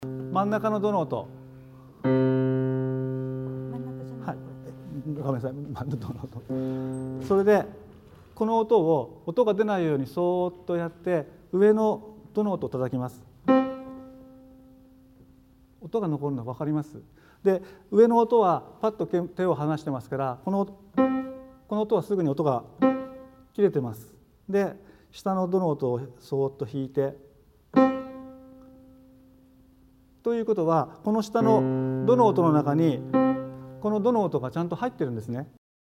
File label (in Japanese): 倍音実験